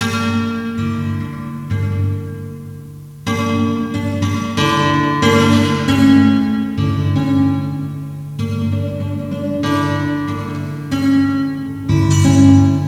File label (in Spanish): guitarra